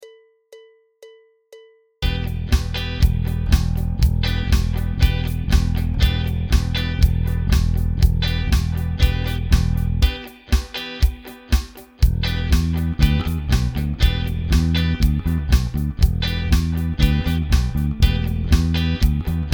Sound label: impro em7